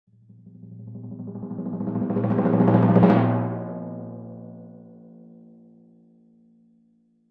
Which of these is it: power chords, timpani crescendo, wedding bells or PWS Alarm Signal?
timpani crescendo